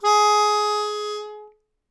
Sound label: Melodica Long, Long Notes Chromatic